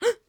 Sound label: Gasp Quick Female, SCREAM; Bottle Rocket Fx; SCREAM LIBRARY